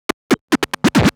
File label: Scratch Fills 140BPM